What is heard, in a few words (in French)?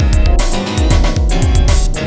cacoph; MOBICLIC 14